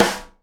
SNARE RIM, Live Snares

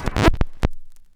HOOPS, VINYLS

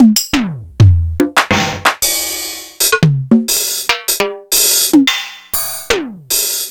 Emu Drumulator

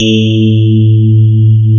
SPARKLES; Wizoo - Powered DX

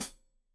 Hat Foot, Hi-Hat, Cymbals Stereo

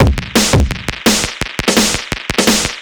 Beat Mooger 170, distorted drum loops 170bpm; musicradar

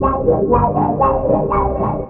ORCHFX, orchestra, Asian Traditions

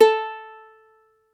UKE A3

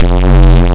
Dance Mega Synth; Best Service; 90 s Sample CDs

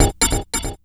Bell; Sonic Foundry (Sony Creative Software); Esoterik Beatz; Saver FX

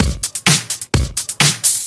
Straight Loop 128 BPM, House

